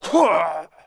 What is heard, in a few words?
fall, monster; thiefboss2